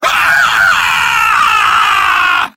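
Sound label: soldier pain critical death; vo; tf2; mvm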